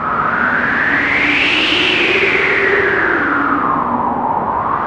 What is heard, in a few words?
se gale; Mother Cognitive Dissonance